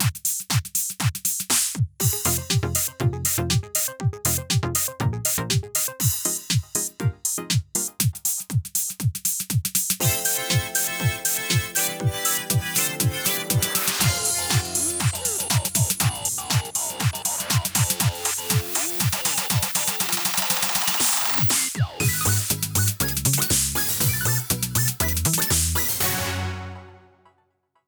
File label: Modelwalk BGM